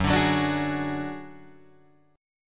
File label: Gb Suspended 2nd Sharp 5th Guitar Drop D